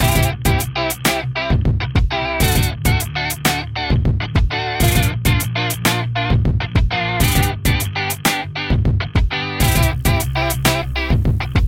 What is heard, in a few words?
Hip Hop Beat